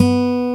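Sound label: B 2 B MARTIN, acoustic guitar; AKAI S6000 CD-ROM - Volume 1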